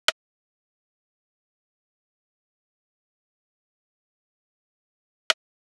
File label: Drum Loop 92 BPM Percussion; Loop Stems; Cymatics; Vibes II Drum Loop Collection; Freefall Drum Loop